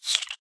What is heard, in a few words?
say, silverfish; mob; Minecraft